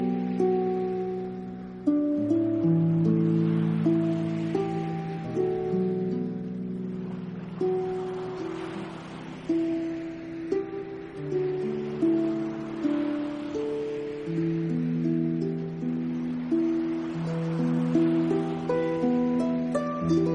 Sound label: Ocean waves to sleep music